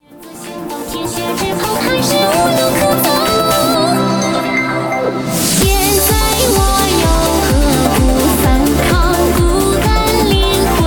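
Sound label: background music crossfade; Invaxion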